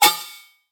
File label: Synth One Shot D#, Dubstep; Synths; Cymatics; Dubstep Starter Pack